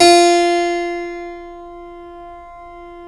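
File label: F3 clavinet left, Keyboards Acoustic; USB Soundscan vol.17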